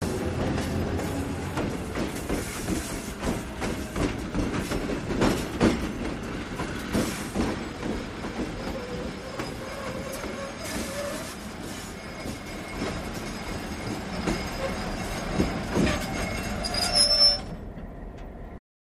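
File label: Diesel Locomotive Brakes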